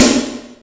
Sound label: reverb acoustic snare sound C sharp key; snare drum samples